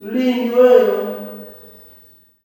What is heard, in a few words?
"LINDE-WAOH", MALE; Spectrasonics; Heart of Africa 2; S.MALEAMB